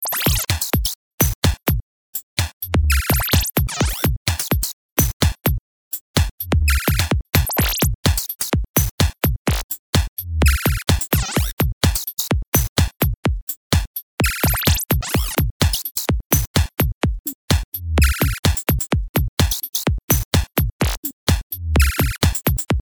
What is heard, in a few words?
Analog Sci Fi Kit